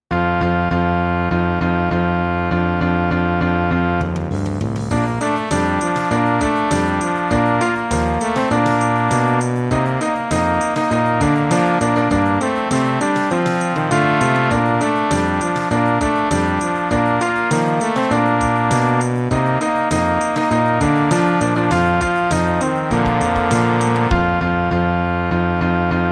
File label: jingle bells